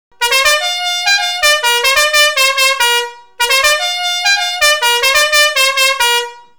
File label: fiamm m4 oh susanna novelty penumatic music horn trumpet bocina car van